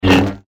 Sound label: Swing